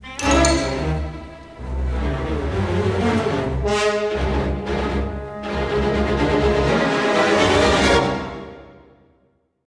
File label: tension, music